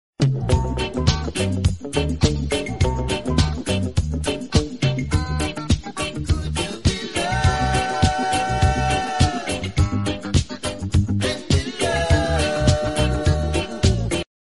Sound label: instrumental